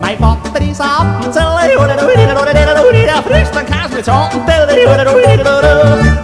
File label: yodel